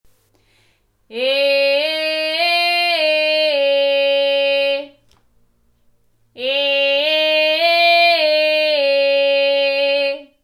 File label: Twang oral